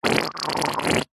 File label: human fart wet dribbly poop; zapsplat